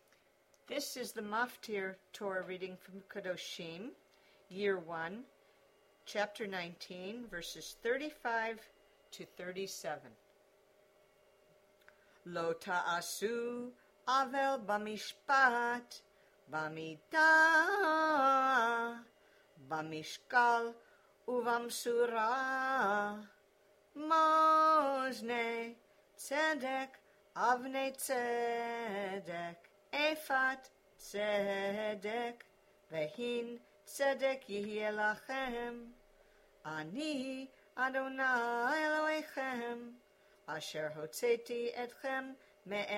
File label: Torah